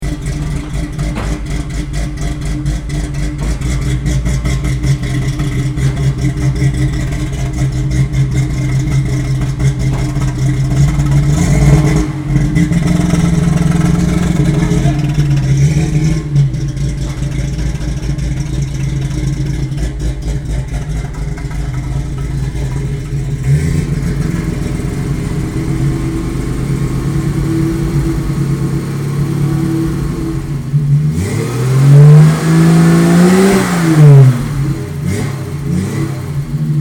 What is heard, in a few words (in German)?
TVR 2500 M Kaltstart; Blackpool